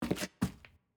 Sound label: Footstep Dirt Rotate